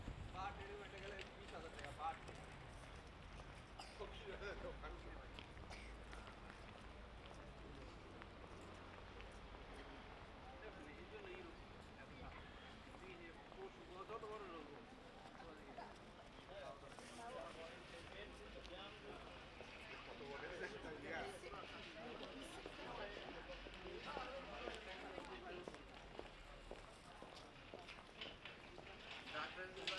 quiet street; IEEE AASP; scenes

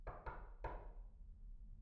knock; IEEE AASP

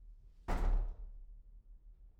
door slam; IEEE AASP

